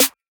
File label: Snare, drums; Ableton Live 12 Lite Core Library